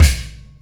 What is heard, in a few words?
Combo Shard, Processed Drumkits